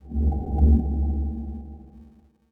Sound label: confirm static echo